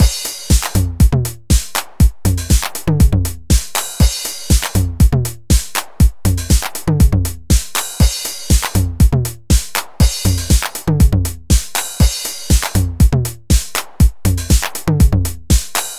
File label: TR-909 FX; Plug-Ins D16 Drumazon